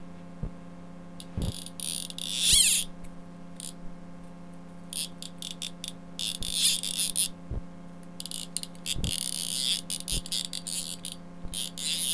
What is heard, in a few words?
magic sound